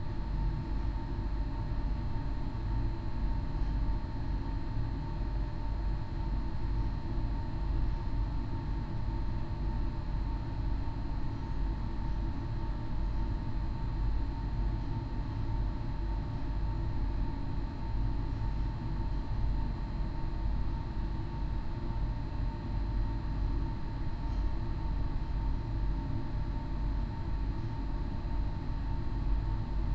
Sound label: Ambience